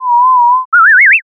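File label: uguisu, Japanese bush warbler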